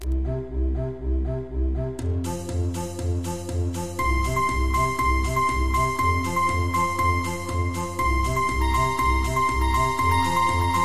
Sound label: beep boop